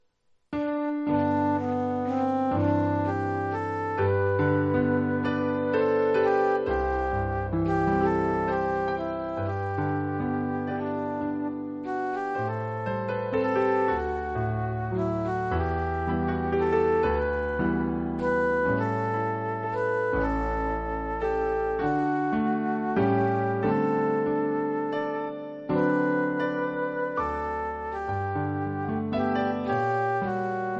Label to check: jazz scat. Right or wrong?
Wrong